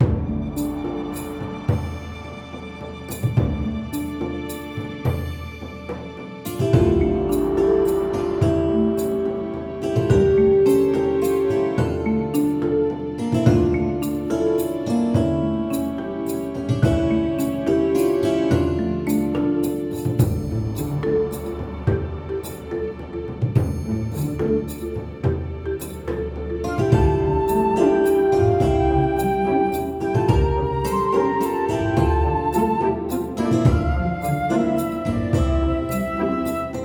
Orchestral Remix; nintendo halloween megamix 2022; Foreboding Forest; (PSMD)

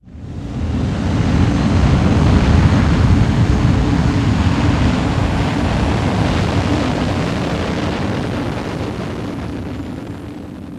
Engine Start All New

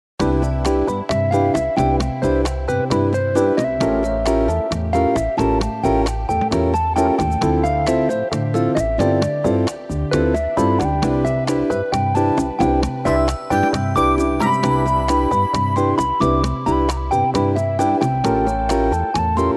Relaxing Shop (Part2)

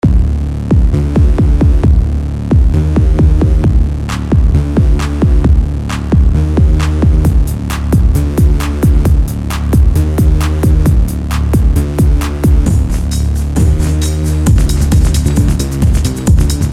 hard noise LOOP; Crystal Cosmos

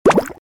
Underwater shoot; Doodle Jump; 3DS, Android, Arcade, DS, iOS, Mobile, Xbox 360

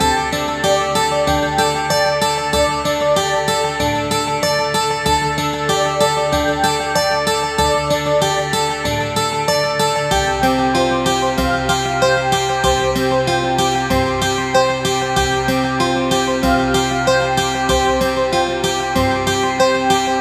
Interlude Loop; Waifu Discovered 2 Medieval Fantasy

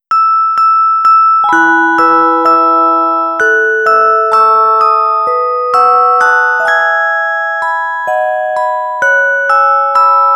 music box ver.; Imouto Paradise 3

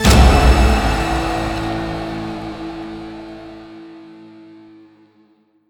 arena match win; Pix the Cat

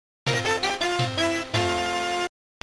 Match Start; Snoopy Tennis; GB

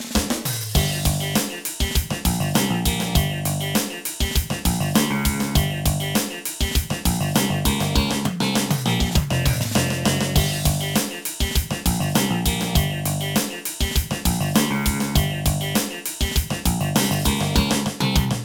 Drum; Power Pro Kun Pocket 13

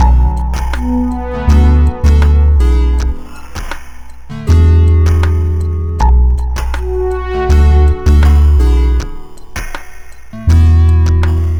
stem outro; Pix the Cat

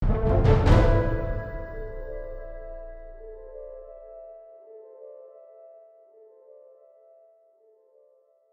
Stinger Transition; Creativerse

